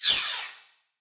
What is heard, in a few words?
garbage out large; tetrio